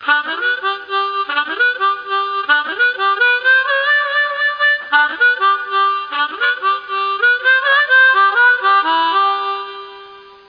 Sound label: Harmonica; Freddi Fish 4 The Case of the Hogfish Rustlers of Briny Gulch